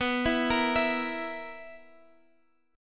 B Major 7th Suspended 4th, Guitar Half Step Down chord